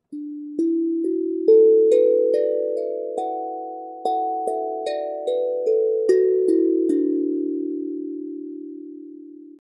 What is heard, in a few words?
Hapi Drum, Perc melodisch